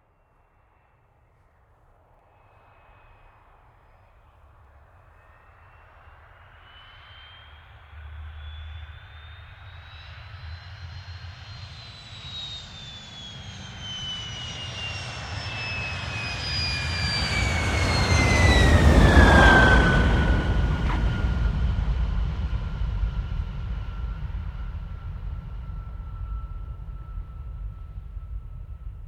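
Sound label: MiG-15 UTI Exterior Landing 30m; Bert Foley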